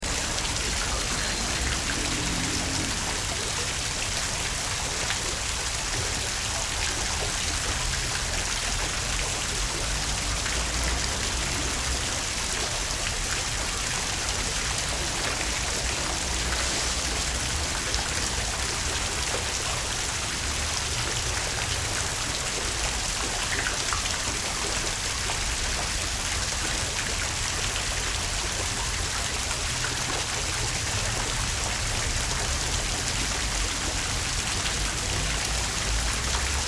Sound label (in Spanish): plazas fuente de agua